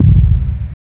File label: alien noise